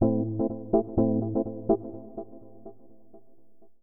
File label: synth house 125 A; eJay; Keys